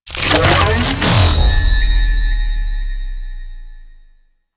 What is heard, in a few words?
Portal Open; Enemies; Sentient Dolicholyst